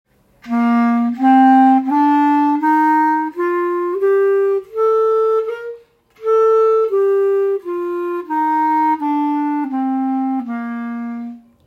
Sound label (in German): So klingt die Klarinette